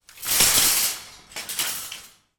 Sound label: supermarket trolleys carts hitting each other